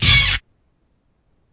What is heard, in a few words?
orch hit